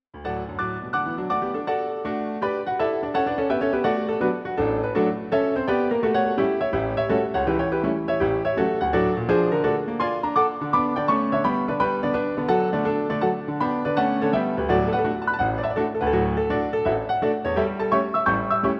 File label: piano Roll